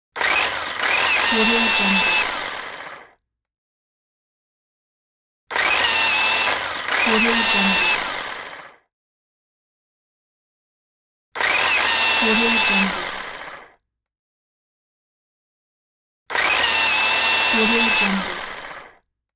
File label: drilling